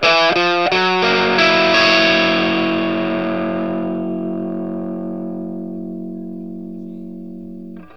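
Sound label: PICK F sharp 7, Vintage Blues Guitar; USB Soundscan vol.22; AKAI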